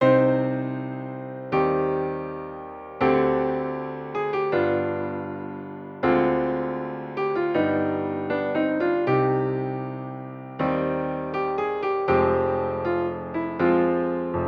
Prelude C Minor

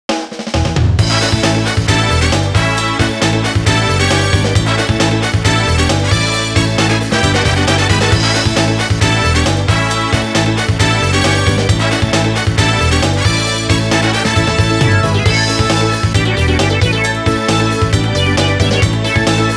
Dramatic Pennant Camp Road

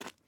ice step; teardown; ps5 windows xbox series xs

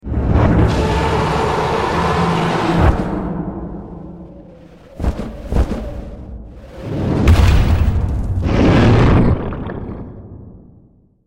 tutorial intro; kingdragon; Might and Mayhem

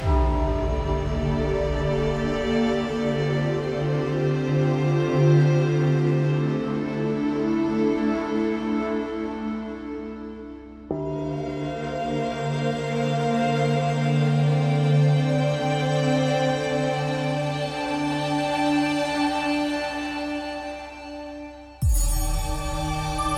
BGM oriental; Gates of Horn and Ivory

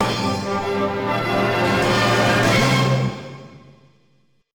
Jingle Win

